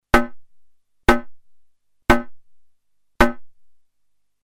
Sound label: electr snare